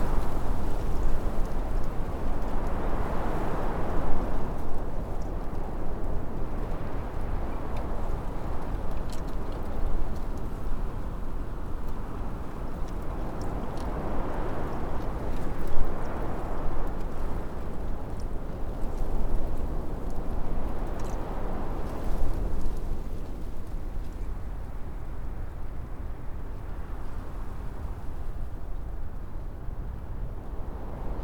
Wind Medium Heavy Storm Picking Up Gusty Prickly Forest Branches